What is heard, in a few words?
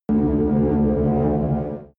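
extrinsic hum, energy; energie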